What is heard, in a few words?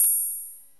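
Open hi-hat, Korg Z1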